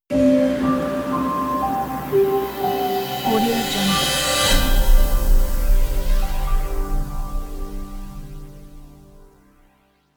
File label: elegant inspirational revealer logo